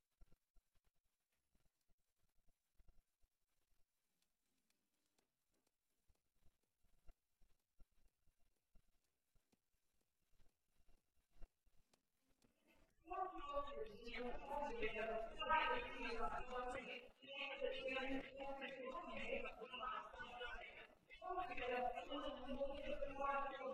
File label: vocals noise